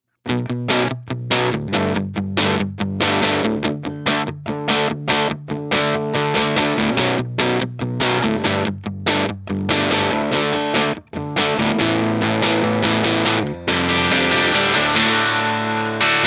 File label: DIRTY Ceramic; JTM; Tone Tubby Ceramic